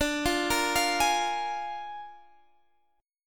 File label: Bb 7th D, chord